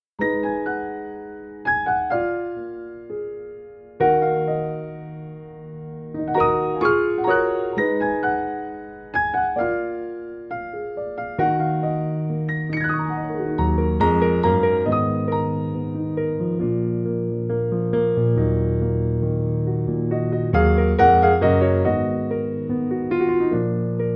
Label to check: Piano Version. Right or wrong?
Right